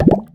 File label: reload